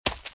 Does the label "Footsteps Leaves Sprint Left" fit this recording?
Yes